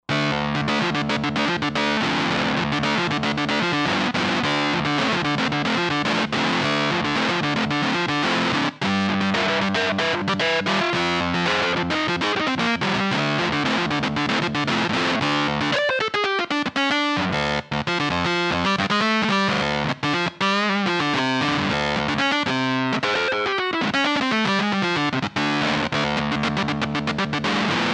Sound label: Phuzz Clav; Roland JV-2080